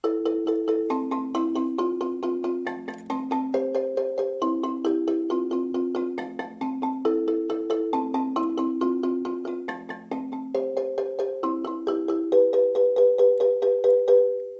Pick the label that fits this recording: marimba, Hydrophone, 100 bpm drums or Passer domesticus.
marimba